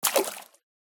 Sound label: walk in water; Poppy Playtime